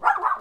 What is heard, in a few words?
barking dog, Small dog barking; Animal Sounds